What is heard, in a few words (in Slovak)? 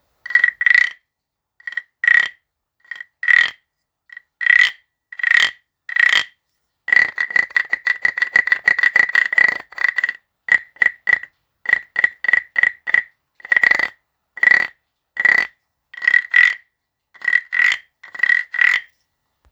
voice, hudobny sarance